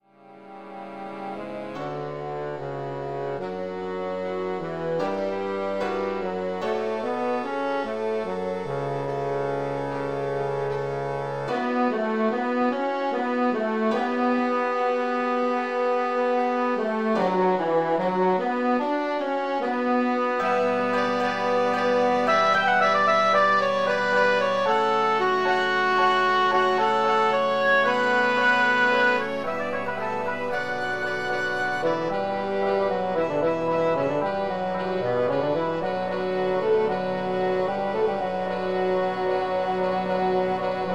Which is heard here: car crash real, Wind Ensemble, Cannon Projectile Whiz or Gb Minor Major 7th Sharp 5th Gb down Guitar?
Wind Ensemble